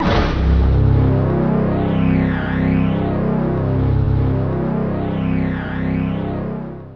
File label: NOISE MAD, DRONES & PADS